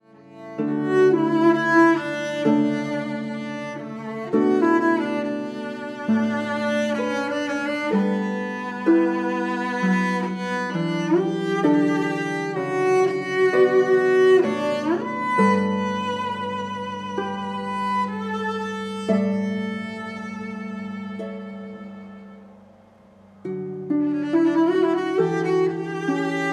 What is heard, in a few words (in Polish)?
Oboj